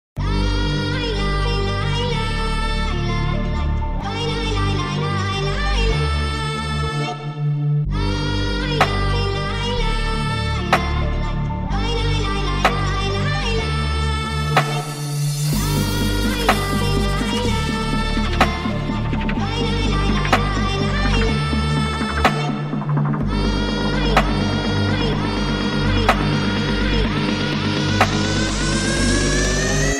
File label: Mobile Ringtone